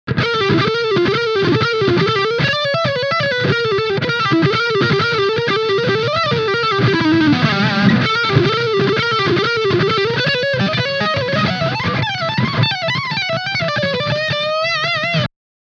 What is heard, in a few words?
Lead lick